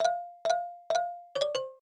Down Taunt; Pac-mans

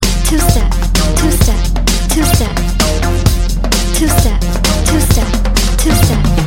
Two Step

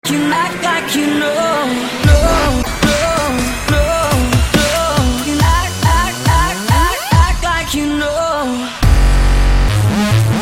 Dubstep

